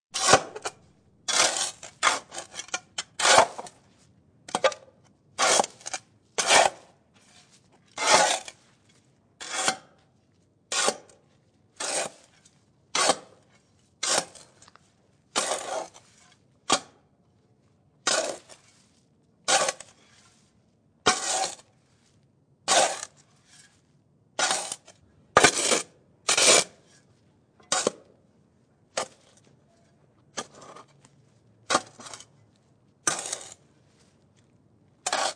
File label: Beeps; 헐리웃특수효과음; 신호-경적